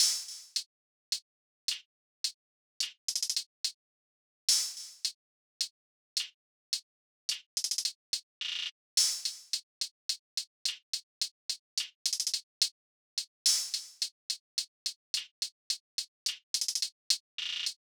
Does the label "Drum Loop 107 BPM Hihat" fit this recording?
Yes